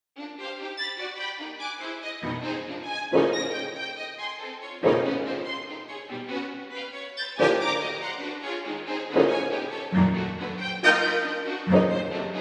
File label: concerto for orchestra; kaloian; IV